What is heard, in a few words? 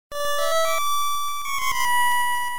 Warp Whistle